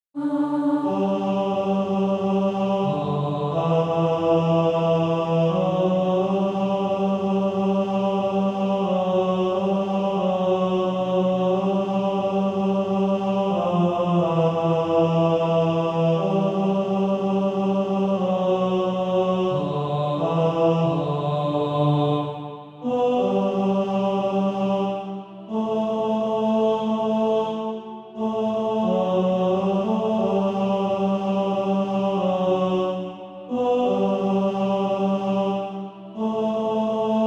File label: Male Choir; 2nd Tenor